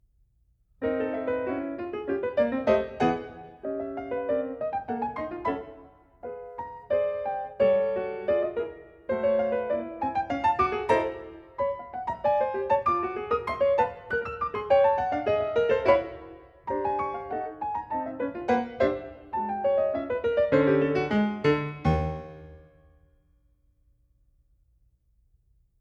Piano

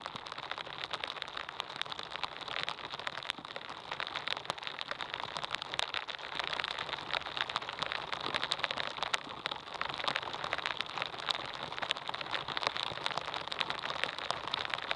Rainstorm, Rains; A Sudden Rainstorm

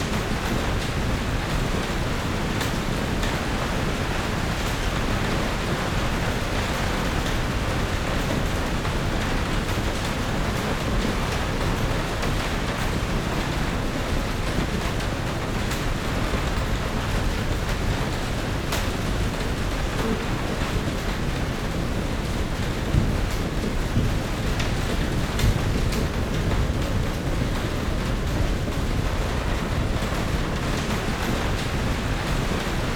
Soft Wind Rain Ambience

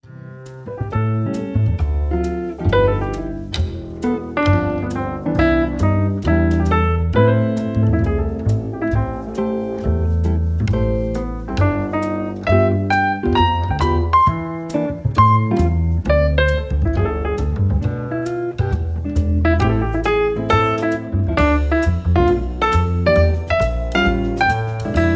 Jazz, Jazz Smooth Paris Nightclub

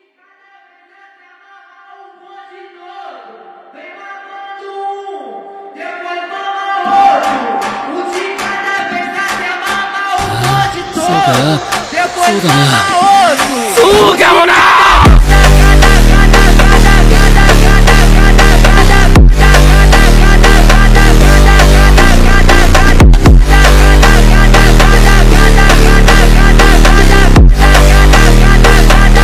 Slowed Reverb

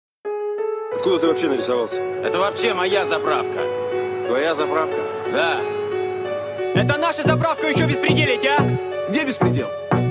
29Hz Low Bass

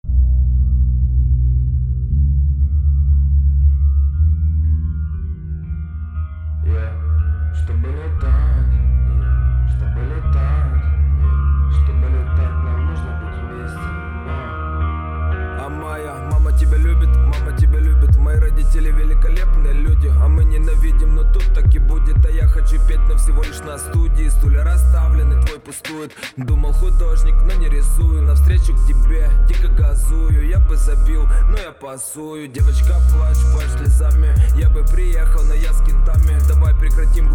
37-41hz

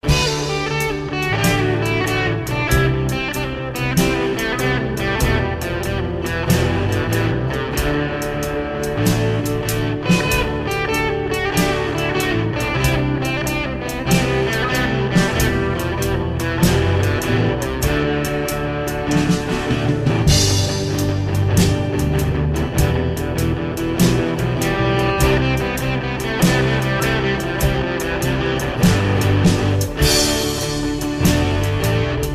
Irish Jig

